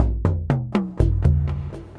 Spiral Frame Drums, Playful Percussion; Ableton; Mood Reel

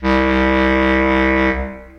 Bass Clarinet Solo Legato Vibrato; Ableton; Orchestral Woodwinds

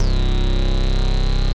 Funk Bass, wavetable; Instruments; Funk Diamond Bass; Ableton; Synth Essentials

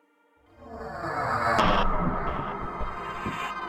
FX Swells; Drums; Ableton; Factory Packs

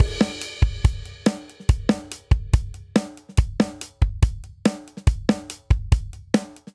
Syncopated Folk Verse 142 bpm; MIDI Clips; Ableton; Factory Packs; Drum Booth

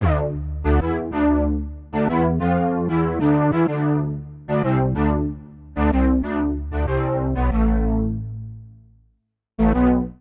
Syd Bass D Dorian 94 bpm, Bassline; Tonal; MIDI Clips; Drive and Glow; Ableton; Factory Packs

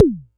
digital pitched, Kickbank; Ableton Foundation FreePacks

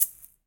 Shaker

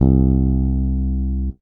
Electric Bass; Ableton; Guitar and Bass

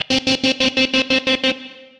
Guitar Chopper Chords, Guitar Amped; Guitar and Bass; Ableton; Factory Packs

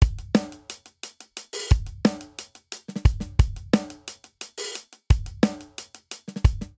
Straight Five Rock Intro 5/4 177 bpm, Drum Booth; MIDI Clips; Ableton; Factory Packs

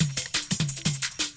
175 D&B Drums; Dance DJ; Drum n Bass

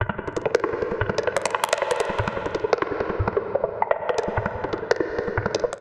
TapDance 165 bpm, Percussion & FX; MIDI Clips; Tonal; Six80Four; Skitter and Step; Ableton Factory Packs

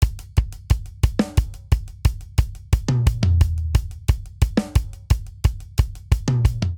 Straight Five Rock Buildup 5/4 177 bpm, Drum; Ableton; Factory Packs; Drum Booth

